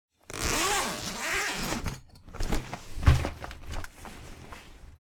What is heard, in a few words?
Large hard case suitcase opening